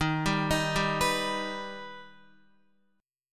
Eb Augmented, Guitar; arpeggiated; x,6,7,8,7,7; 4F,4A,5C,5G,5C,6E

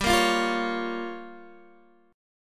G Minor 7th Flat 5th, Guitar DADGAD

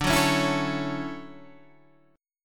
D-Major 9th D

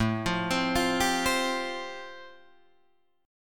A-Minor 7th Sharp 5th, Guitar Drop D, chord

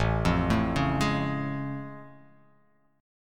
A Augmented Major 7th A; arpeggiated; JGuitar; x,0,3,2,1,3,x,x

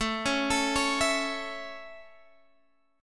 A Major, Guitar, Standard; arpeggiated

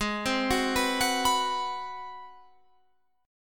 Ab 7th Sharp 9th down Guitar Open F; arpeggiated

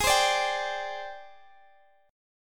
Ab Major 7th Flat 5th Ab Guitar Open G; x,x,18,17,15,17

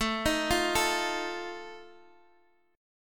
F 7th A

